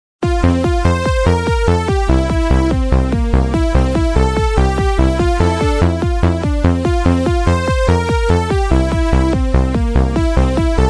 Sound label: techno remix; pokemon center